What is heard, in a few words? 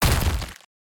blow, battle